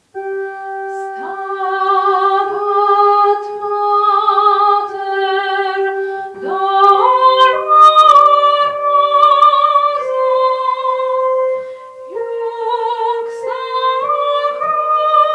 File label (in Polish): soprany